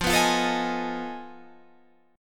F 7th Sharp 9th Flat 5th F down Guitar Half Step Down